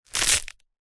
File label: gore small bone break crunch